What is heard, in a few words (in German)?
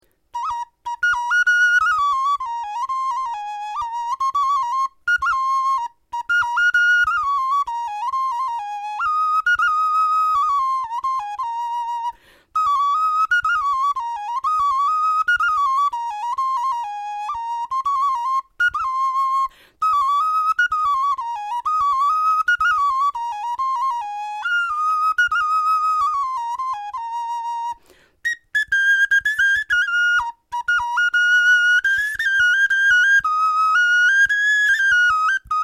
melodie, knochenfloeten